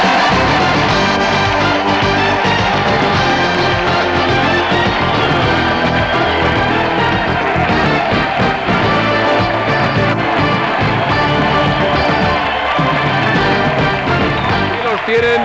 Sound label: Contestant entry fanfare